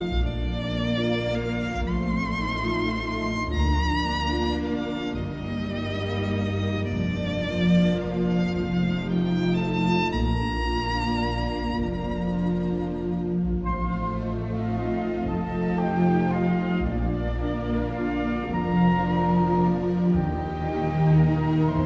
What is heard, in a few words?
best austrian ringtone